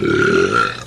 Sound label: burp medium